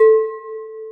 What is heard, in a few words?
celesta, programmable speaker